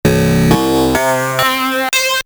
amiga metal keys; commodore amiga

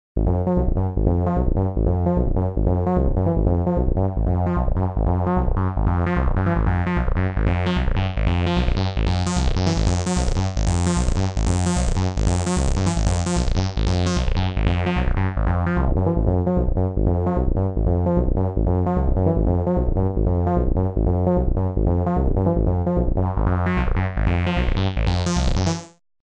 bass line, Roland JV880

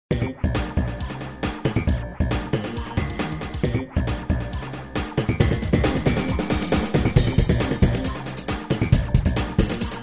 All factory songs, Emu Xtreme Lead-1

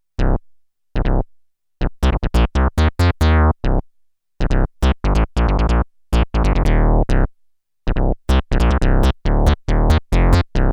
bass, synth Roland alpha juno; mooga

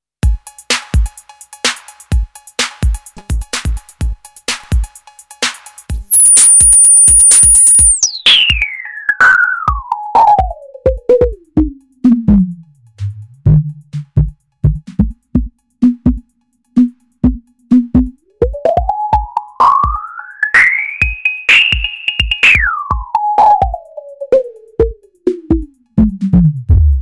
lowpass high resonance, Electrix Filter Factory

